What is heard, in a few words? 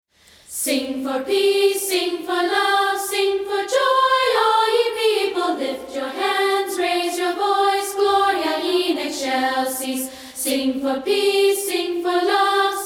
A Cappella